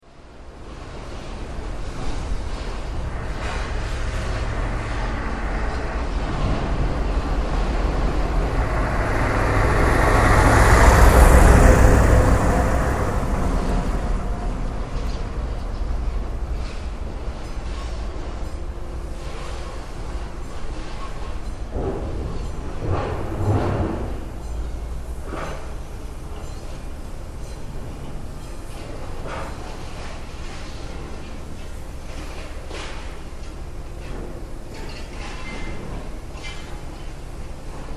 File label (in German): schrottplatz; koeln; firma steil gmbh in poll